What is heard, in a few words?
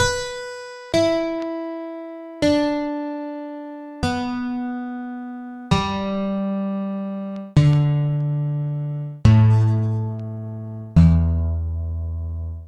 lyra; theodyssey; grifos